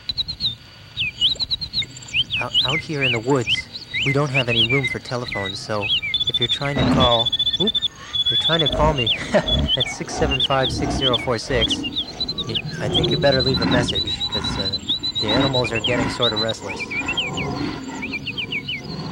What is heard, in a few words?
Woods